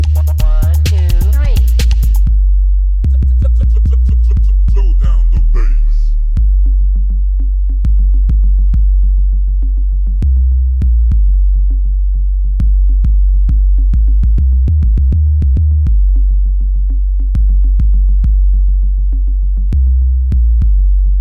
Subwoofer Mix

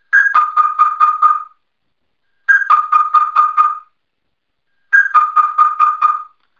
Auto Page

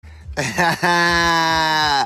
laugh; Wiz Khalifa

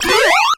Weapon Button; Ex-Aid

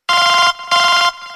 Fast Ringing

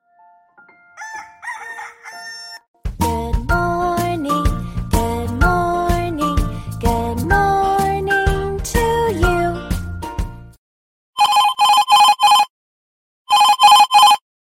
Clock Alarm; Htc

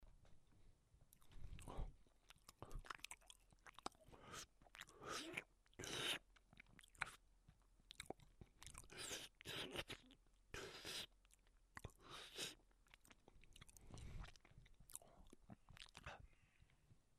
Eating An Orange

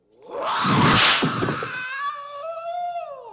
Kick

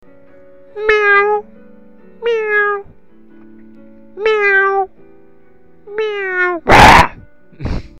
Meoow

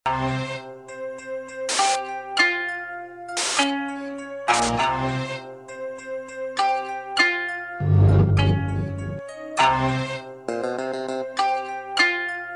Kurdi Beat

